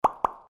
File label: sent message failed; Infinix Hot 12 Play